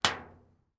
impact metal board

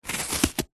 apple slice with knife; zapsplat

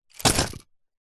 office pencil case set down on soft surface; zapsplat

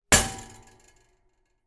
impact metal basket draining board hit resonate

